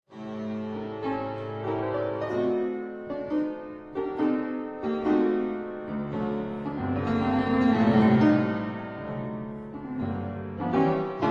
Keyboard Sonata in D minor, Adagio Maestoso ed espressivo assai